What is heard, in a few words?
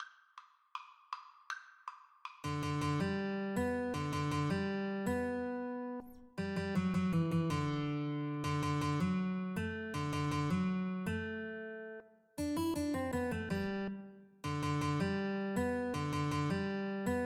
Guitar Duet